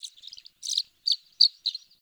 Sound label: Forest Open Glade Bird Close, Day